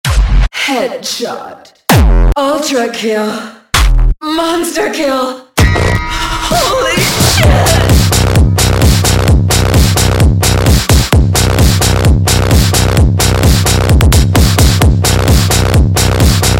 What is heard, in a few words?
Kick Selector